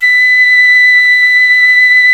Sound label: KENA; World Combo